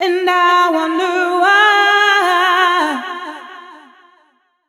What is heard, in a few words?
f 126 mel f-sharp, fem Vocals